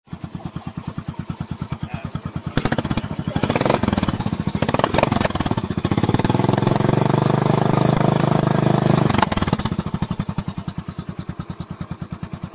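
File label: Slip-On, exhaust; Savage Jardine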